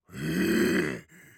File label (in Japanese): 攻撃